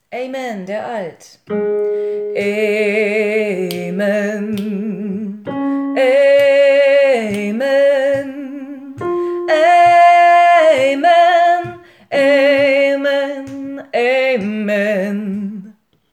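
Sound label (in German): Amen alt, gospel choir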